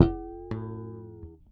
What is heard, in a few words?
Harmonic Bend One Shot E, Bass; Bass X